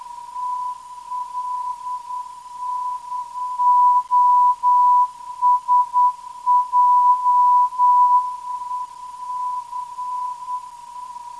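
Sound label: TLC 422kHz, non-directional beacon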